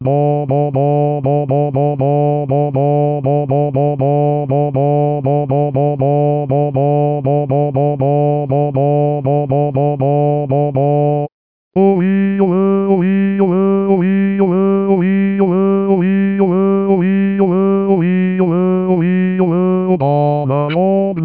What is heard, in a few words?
Baryton